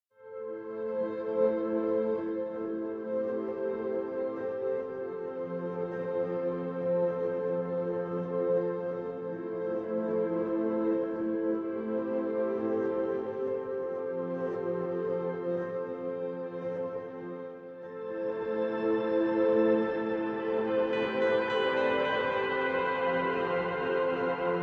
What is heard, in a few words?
Ambient